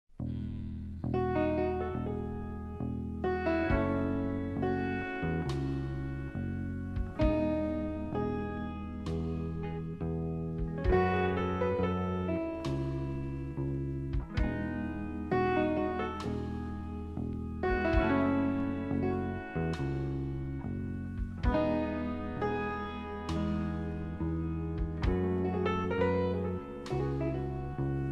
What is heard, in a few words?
slow instrumental